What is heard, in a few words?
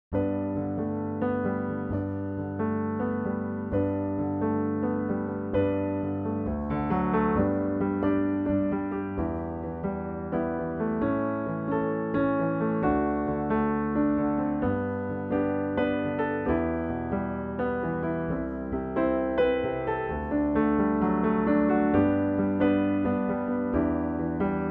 Alleen piano